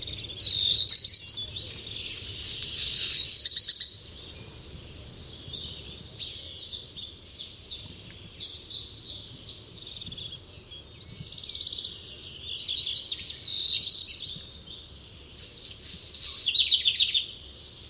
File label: black redstart song